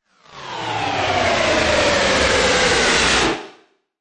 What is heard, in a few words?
Scifi Downturn; Scifi Production Element - Downturn. Suitable as science fiction film and game production; Science Fiction Sound Effects; Lynne Music